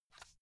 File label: watch case small open quick